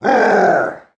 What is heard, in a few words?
Bowser's roar